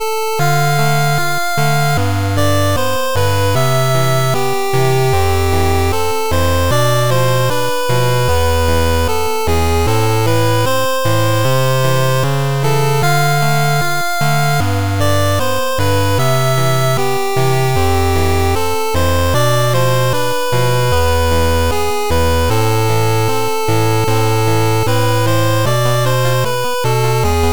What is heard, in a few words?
8bit Song Of Sorrow